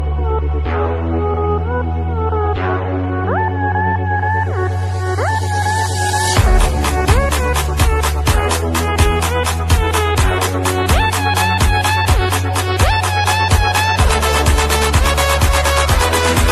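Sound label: english music ringtone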